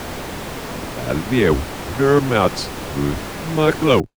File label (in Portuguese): misterio